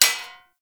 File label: Short Sword Thrown Impact Armour